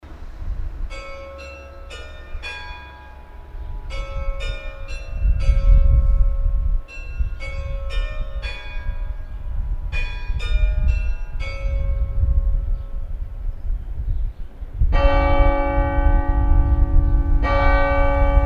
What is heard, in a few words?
bells at Vimoutiers; Holiday 2001 in France